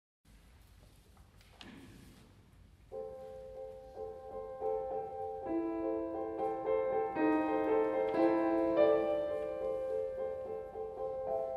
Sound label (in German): Glocken